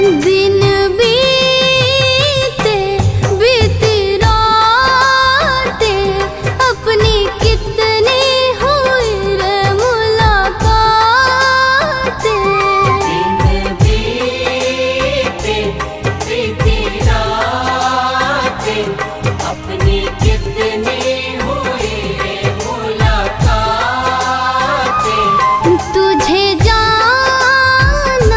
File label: Shyam Jholi Bhar De Female, Bhakti